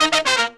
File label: 120BPM, Horns; 90 s Sample CDs; Zero-G; Phantom Horns